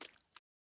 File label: water step; teardown; ps5 windows xbox series xs